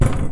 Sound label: Furniture